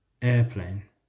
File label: airplane; how many toys